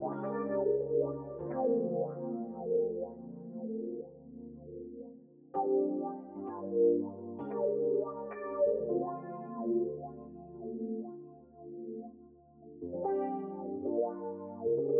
Wah EP

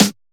Snare; Tu Corte

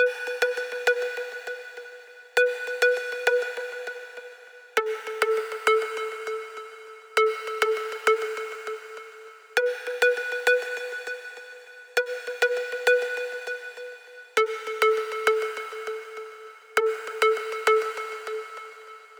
Drop Hi Pluck A 100 Wet

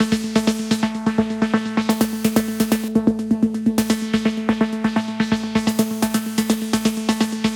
Synth Loop 127bpm A

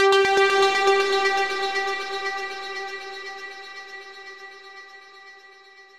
Synth Stab 120bpm G; Zenhiser

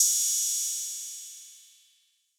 606 Cymbal 90s Hit

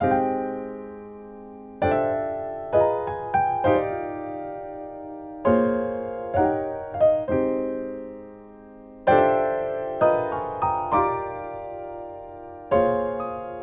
piano

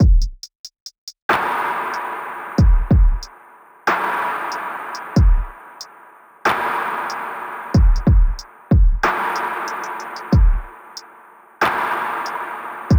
Drum loop 93

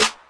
snare

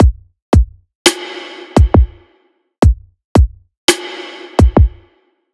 170 Drum Kick Snare

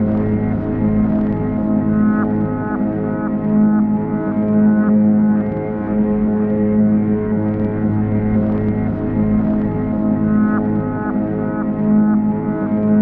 Atmos G m

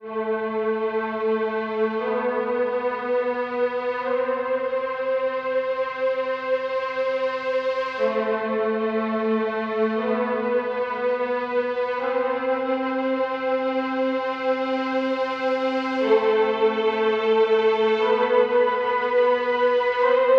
Strings clean 120 A min

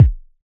Synth Kick

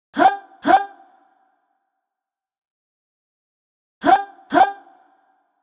124 Vox Huh huh

